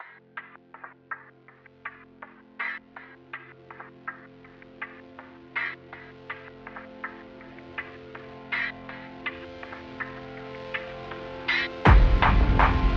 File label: Groove and Bass